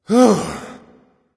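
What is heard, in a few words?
non-verbal sigh; smite; voicepack; houyi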